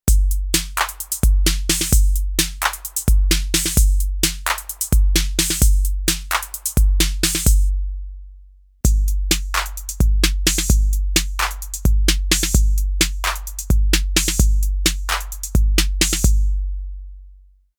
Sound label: Roland TR-08 TR-808